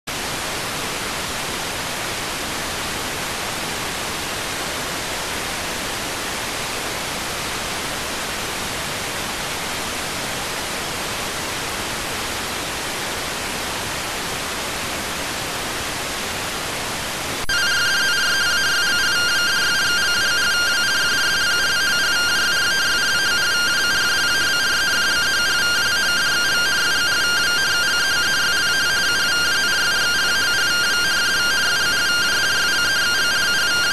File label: rtty rx